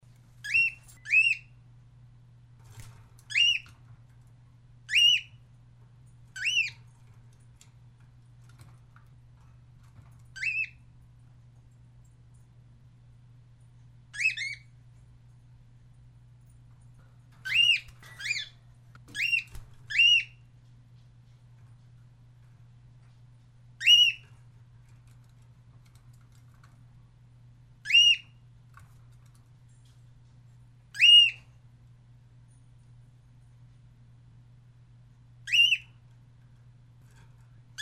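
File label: Cockatiel, parrot calls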